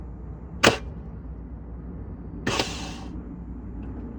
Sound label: r53 starter clicking but engine not turning over; battery ok; starter issue